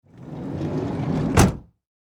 Vehicle Van Side Door Slide Shut Automobile Minivan; Cars (Sound Effects:Vehicles)